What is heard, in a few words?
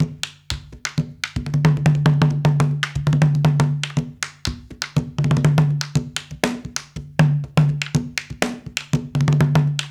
Haitian Congo, World Dance; Spectrasonics - Supreme Beats